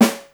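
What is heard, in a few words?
snare march, Yamaha Motif ES drum machine; 300 Drum Machines; Yamaha Motif ES-Series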